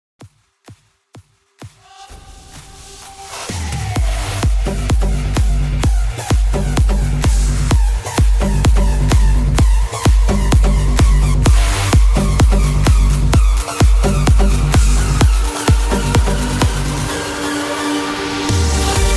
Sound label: 128 BPM